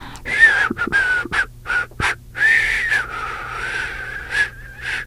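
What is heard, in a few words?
nervous whistle, teen girl; video game; Left 4 Dead; Source Engine; survivor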